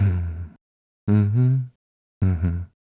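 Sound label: Vox Hum, Korean; Riheet; kingsraid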